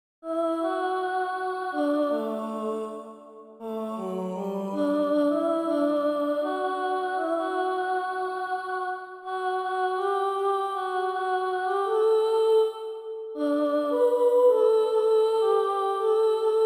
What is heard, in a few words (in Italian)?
Voce 1